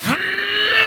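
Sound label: voice charge up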